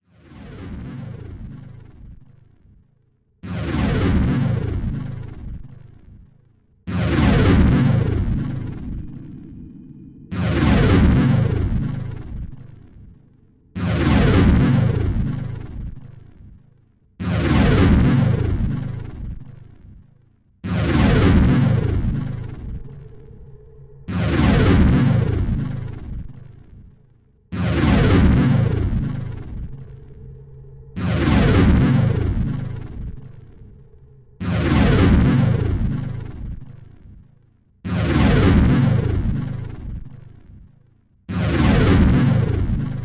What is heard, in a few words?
Outer Beyond Boss Growl; Axiom Verge